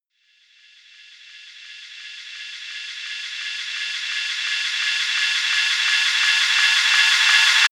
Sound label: short approaching rise, Risers; whooshes rises